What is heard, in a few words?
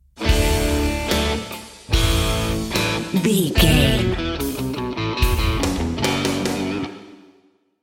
big game rock stinger